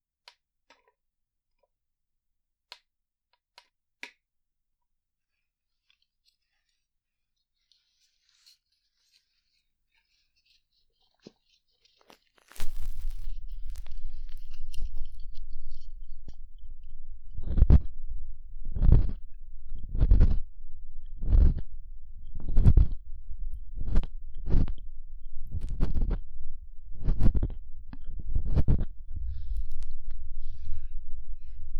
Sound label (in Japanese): 材質感を感じるリアルプラスチック耳かき音, ASMR